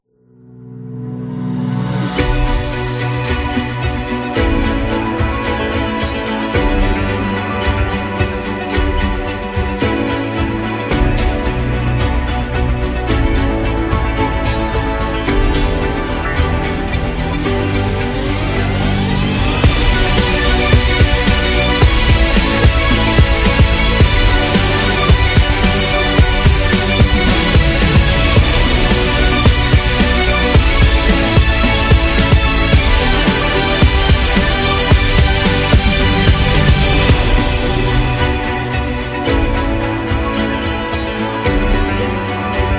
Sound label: Epic Rock